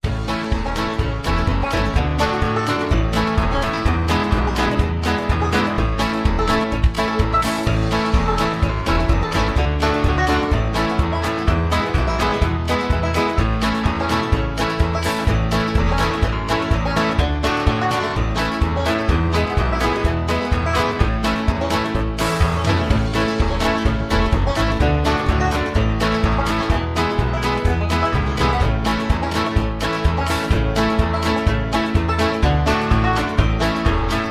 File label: Low Key